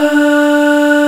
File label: Breathy Vox D3; Proteus 2000; Digital Sound Factory Proteus 2000